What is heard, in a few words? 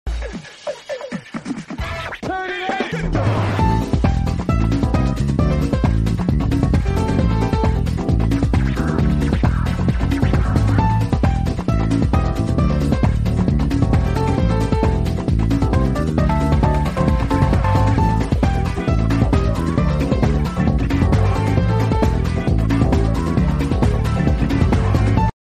ringtone; metal sonics